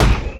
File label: heavy metal, footsteps; player; heavyfeet; Dystopia